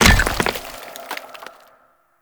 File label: Stone Pillar Destroy; Ambience; MITW; Warframe; Duviri; Murmur